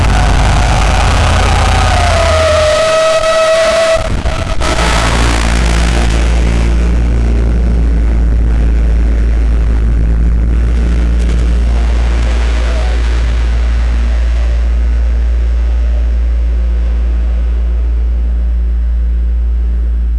a radiating blast that is, beam

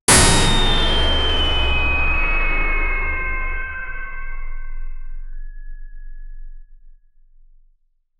a mystical sword slash; videogame

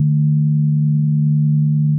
synthetic

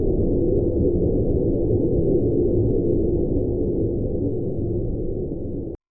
cold ambience

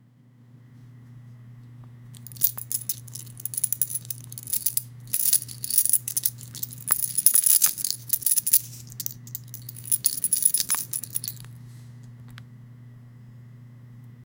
cash obtained sound; finance

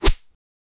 powerful and loud katana; martial arts

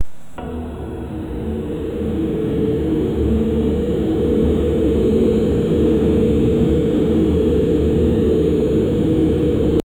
create a dark ambient sou, metallic clinks

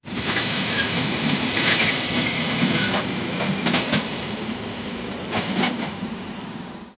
the sound of the wheels, electric train

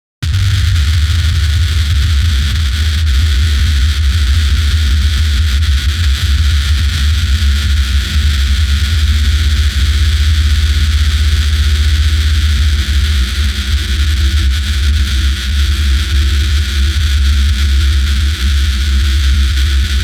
fast cinematic rapid low, riser

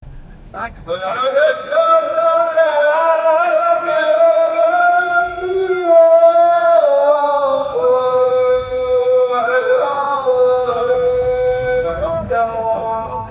call for islamic worship, adhan

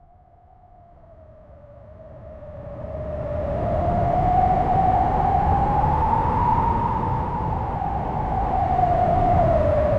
whoosh metallic wind epic

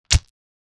slim enemy die

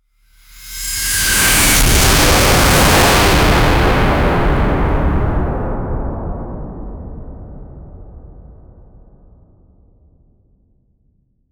overwhelmingly, air

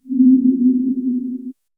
quiet final gasp followed; ethereal

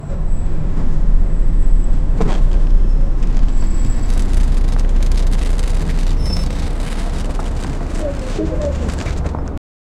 layered city ambience, car horns

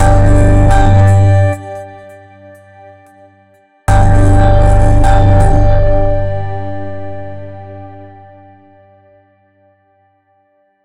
g major scale

